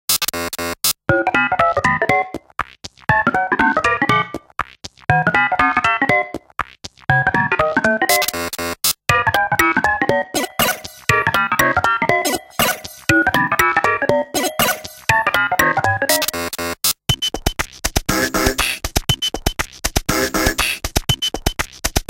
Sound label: Computer OK, Nokia Tones